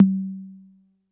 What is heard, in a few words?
C3 Analog Tom, Yamaha PSR-E323